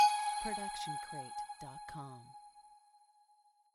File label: tonal blip; soundscrate